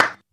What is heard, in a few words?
Claps & Snares